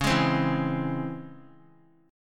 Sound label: C sharp Minor Major 7th C sharp, chord; C#mM7 strummed; 25,27,27,26; JGuitar